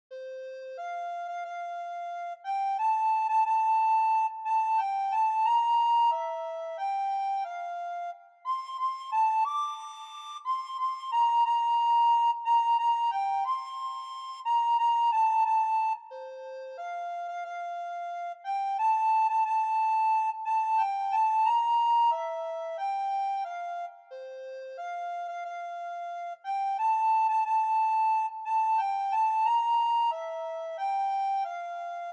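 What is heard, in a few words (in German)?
solo O Tannenbaum Blockflöte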